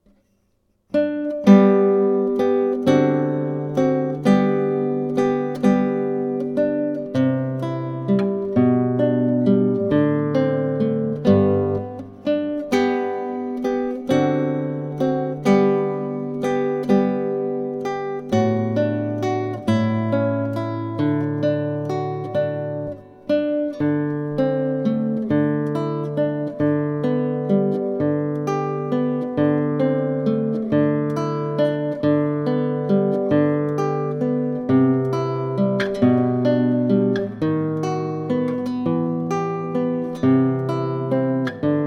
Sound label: allegretto, guitar